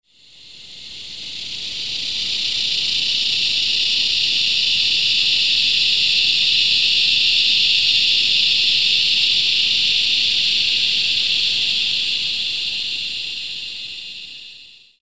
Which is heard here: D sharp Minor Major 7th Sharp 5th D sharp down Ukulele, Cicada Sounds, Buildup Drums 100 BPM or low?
Cicada Sounds